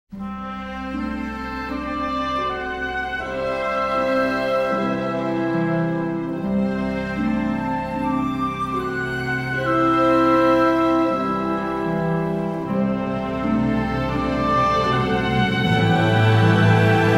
Triple Concerto for Violin, Cello, Piano, and Orchestra